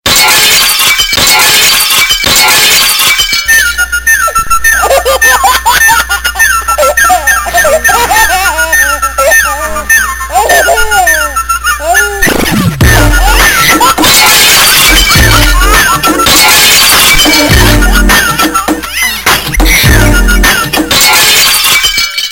Breking Glasess

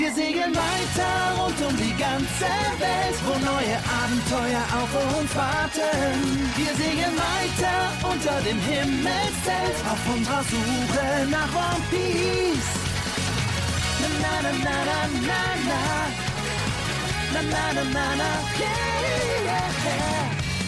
Jungle

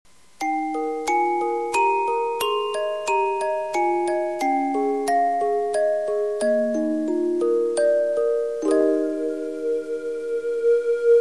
Cute Tone

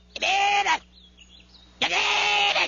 Call; Towel

